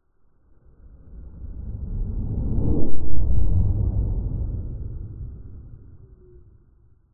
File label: science fiction spacecraft fly past internal pov; zapsplat